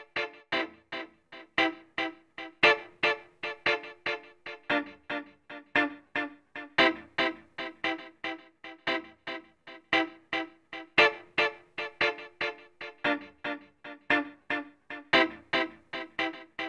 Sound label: Guit; GUITAR; 115 BPM